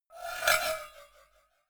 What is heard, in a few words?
cowbell scratch hit clank hard